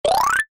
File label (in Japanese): 場面切り替え 木琴 上り 速め